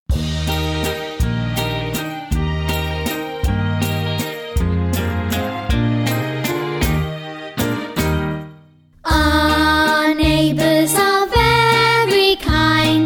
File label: karaoke